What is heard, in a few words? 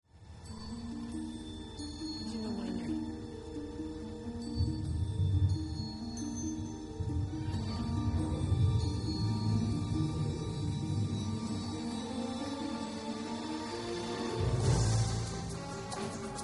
trombone; magic; Opening moments